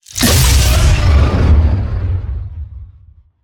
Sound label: Banshee Missile Launch; Evolve; Elder Kraken